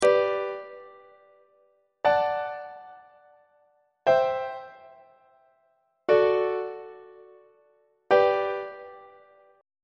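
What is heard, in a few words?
G major inverted imperfect authentic cadence on treble clef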